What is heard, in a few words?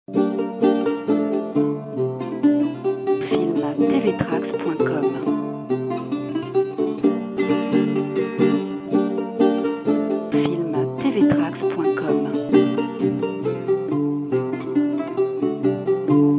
Finale Bransle; A Leroy; Filmtv-tracks